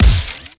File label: Sci Fi Shotgun Fire Heavy; Guntech